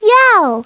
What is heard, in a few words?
yell; Treasure Galaxy